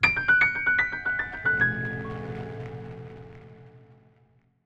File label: Jingle Secret Room Find; The Binding of Isaac Rebirth Afterbirth